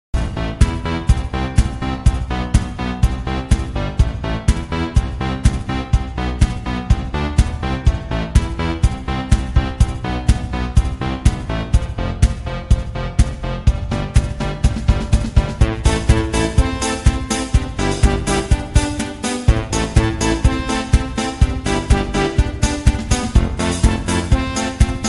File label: Level Track; block breaker 3